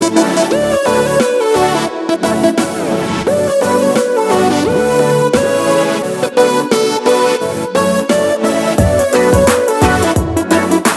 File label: Ringtone Instrumental; Romeo Juliet